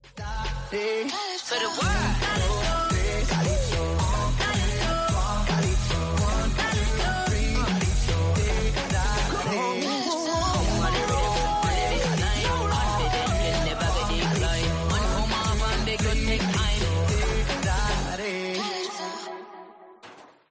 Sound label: calypso